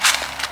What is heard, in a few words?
Leaves